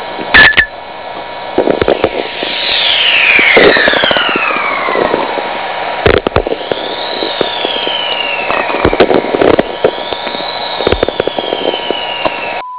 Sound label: whistler train